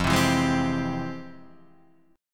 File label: F Minor Major 9th, Guitar Standard, chord; FmM9 strummed; JGuitar